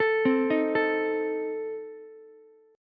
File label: A Diminished A 14,12,11,12 down Ukulele; Adim arpeggiated; JGuitar